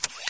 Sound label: utopia restore up, windows sound schemes; windows 9x